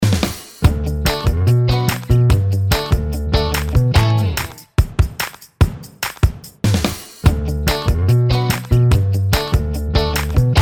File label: segment ending jingle bed with doorbell